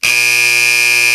lose; RS Games Sounds; Go Fish

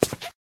concrete step; Golden Crayon Sounds

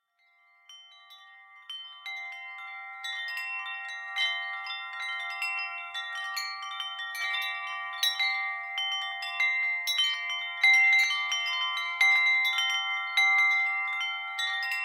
windchimes; Original TK Sounds

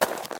gravel step; Golden Crayon Sounds

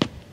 stairs step; Golden Crayon Sounds

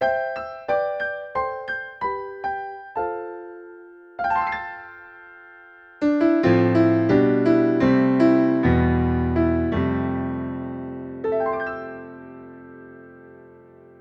grand piano, Yamaha NP-30; Demo Songs

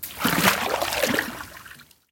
deep water step; Golden Crayon Sounds

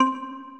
scrolled tone, Old TalkBack Sounds

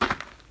gravel step; Golden Crayon Sounds

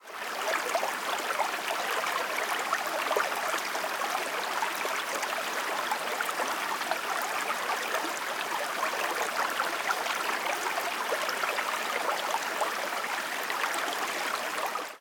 water; Golden Crayon Sounds

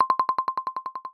level urgent; iOS Sounds

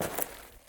dirt step; Original TK Sounds

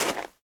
snow step; Golden Crayon Sounds

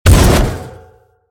catwalk fall; Golden Crayon Sounds

